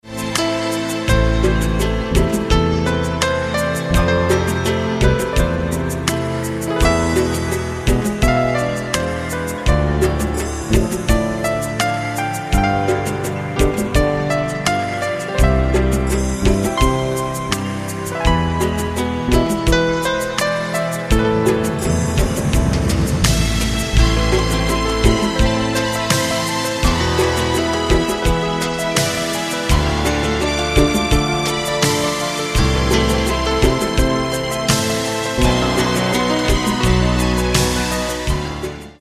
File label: Mobile ringtone